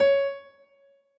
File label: piano